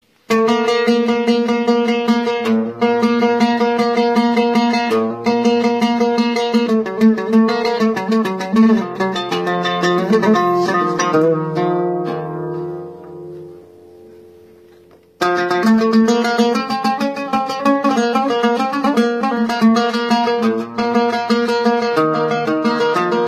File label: oud